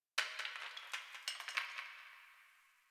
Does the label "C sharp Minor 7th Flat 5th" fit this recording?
No